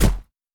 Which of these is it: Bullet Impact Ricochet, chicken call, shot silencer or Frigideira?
shot silencer